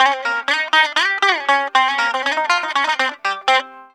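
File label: electric bouzouki; EL.BOU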